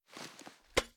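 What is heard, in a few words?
sniper reload empty end; gmod; iw8; alpha50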